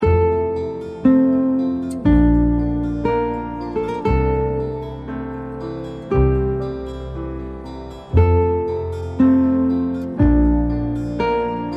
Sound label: Carillon